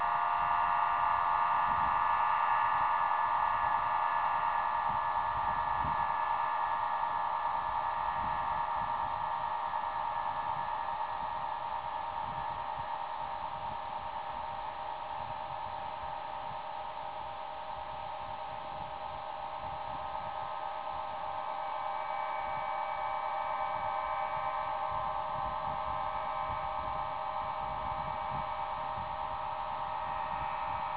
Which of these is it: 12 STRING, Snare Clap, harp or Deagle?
harp